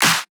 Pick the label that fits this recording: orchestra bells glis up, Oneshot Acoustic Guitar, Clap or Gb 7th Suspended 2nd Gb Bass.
Clap